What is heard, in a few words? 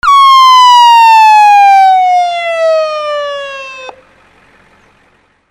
VEHICLES